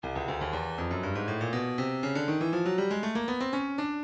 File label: stylus breakbeat midi files 120 bpm; Sweaty